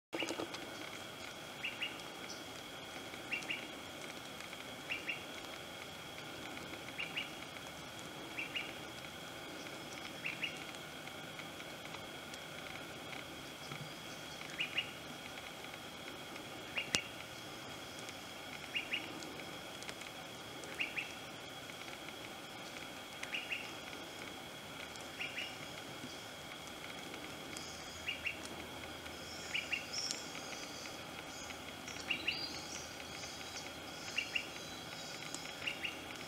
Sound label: Numbat, حیوان نامبت